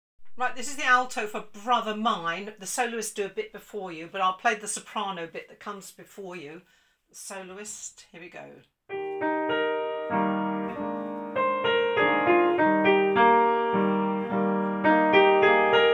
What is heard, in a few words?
alto